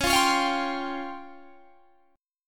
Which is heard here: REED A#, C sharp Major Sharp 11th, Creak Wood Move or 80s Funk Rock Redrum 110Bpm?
C sharp Major Sharp 11th